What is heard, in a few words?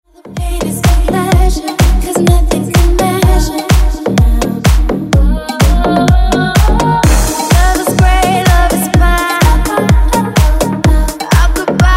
Bootleg 126BPM